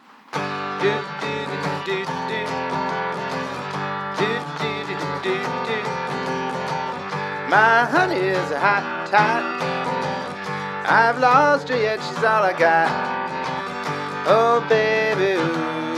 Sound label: oh baby ooh